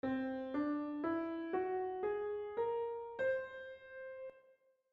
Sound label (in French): gamme par tons